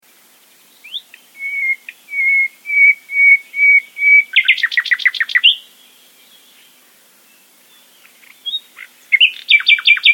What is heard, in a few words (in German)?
nachtigall